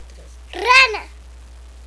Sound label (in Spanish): rana; articulatory evolution